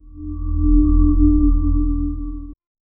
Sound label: fantasy sorceress spellca, spellcasting